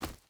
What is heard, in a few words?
footstep hide forest run; Rust